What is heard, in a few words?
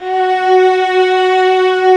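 violas, ambience, forte; String Master Series; Vlas Sect3-4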